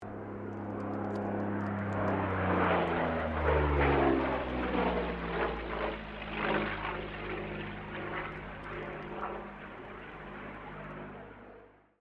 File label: AIRCRAFT P-40 KITTYHAWK PASS STEREO; P-40 Kittyhawk / Warhawk / Tomahawk; Airplanes; Vehicles